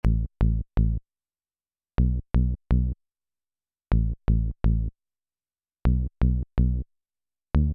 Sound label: minitaur house